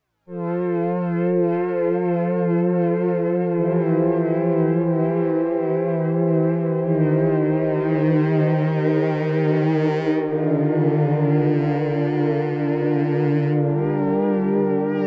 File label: Poly Aftertouch Pad; Super Gemini